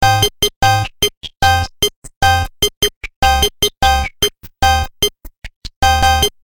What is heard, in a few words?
Misc loop Riff